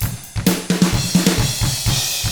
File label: HARD ROCK, Drums